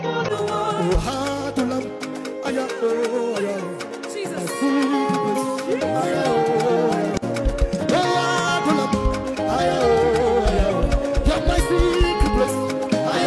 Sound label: Praise Version, harps